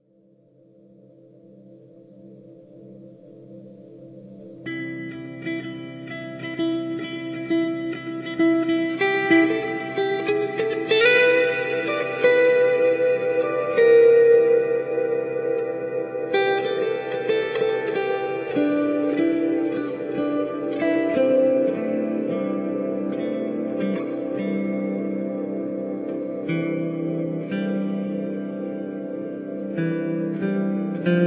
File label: improv solo, guitar